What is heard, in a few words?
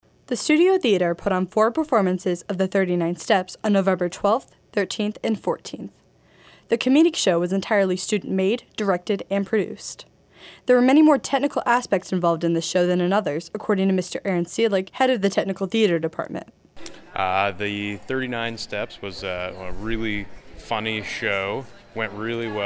BEAT